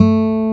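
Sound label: A 2 MARTIN, acoustic guitar; AKAI S6000 CD-ROM - Volume 1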